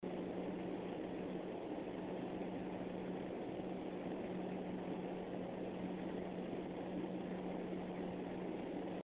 acalpine 7 pro low

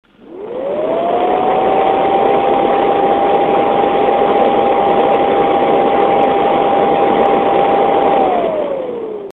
cpumate daa115500

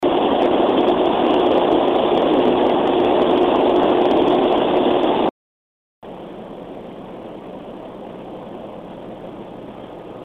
nph775140hc hilo

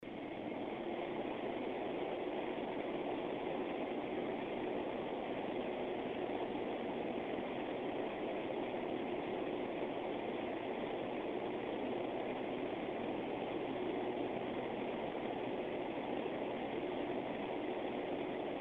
glacialtech 5610pw l

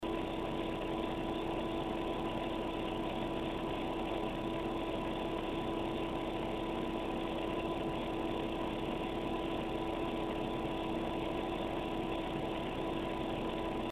pump

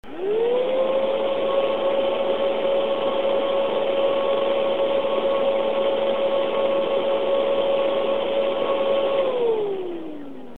swift mcx c370

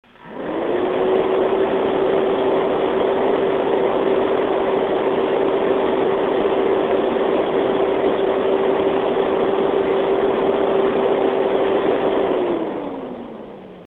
spire sp411c5

